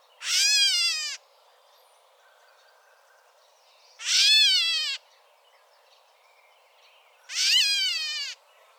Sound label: gray catbird call